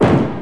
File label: Ball Drop, bowling; PBA Tour Bowling 2001; Dreamcast